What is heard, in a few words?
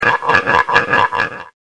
guiro